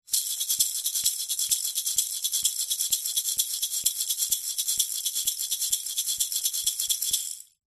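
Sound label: musical percussion shaker maracas kids rhythm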